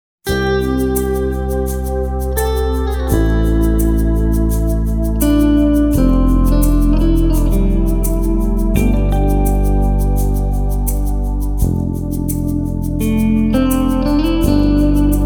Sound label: dreamy guitar